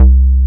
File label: Synth Bass, Roland